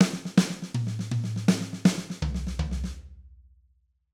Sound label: Triplet Fill, drum